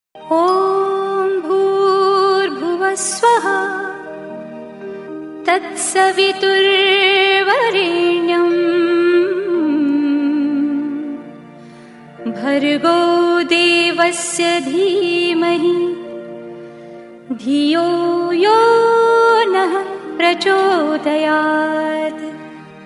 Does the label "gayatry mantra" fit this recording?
Yes